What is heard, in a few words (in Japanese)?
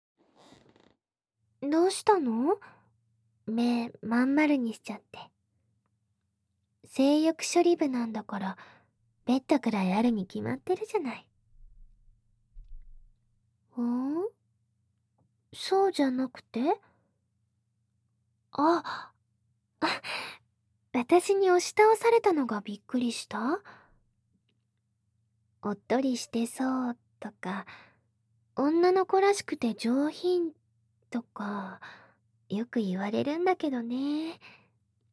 乳首責め、耳舐め, ASMR